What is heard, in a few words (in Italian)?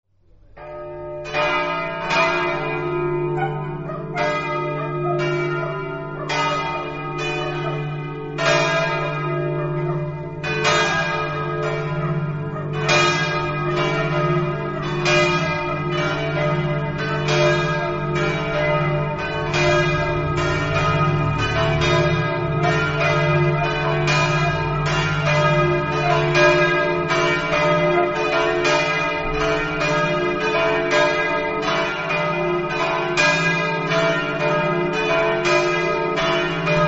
faedis, campane